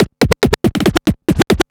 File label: Scratch Fills 140BPM